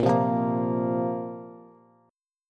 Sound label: Bb Suspended 2nd Bb down Bass; strummed